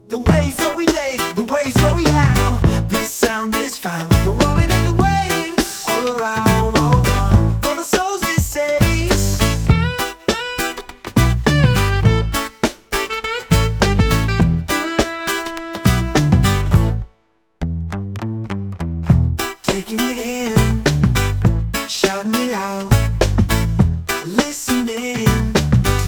Rolling in the Waves Reggae; The Montego Bay Way

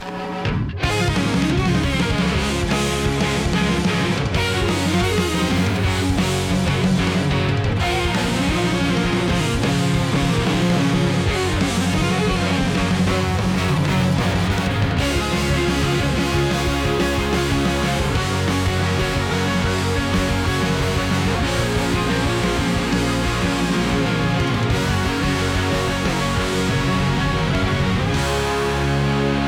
Answer Instrumental

